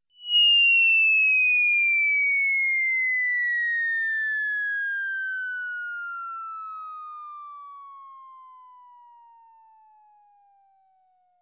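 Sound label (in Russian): очень долгий свист и падение с разрушением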